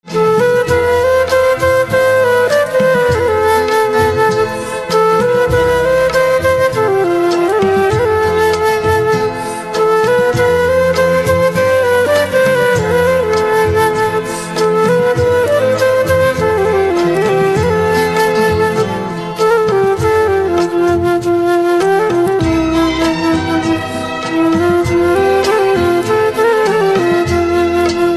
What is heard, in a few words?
Flute